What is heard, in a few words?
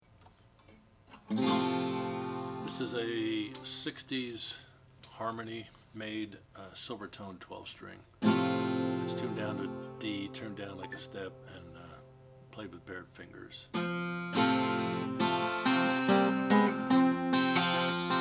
silvertone 12 string 319; 1967; harm; sunburst